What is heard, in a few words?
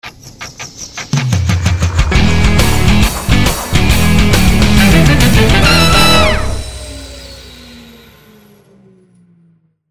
helicopter mission